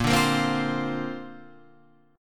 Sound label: A sharp Major Add 9th; A#add9 Chord {6 5 8 5 6 8} strummed